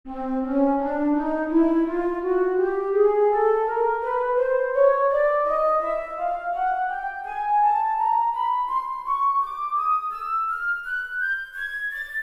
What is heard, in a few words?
chromatic scale flute